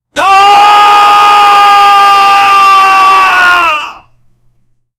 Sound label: human vocal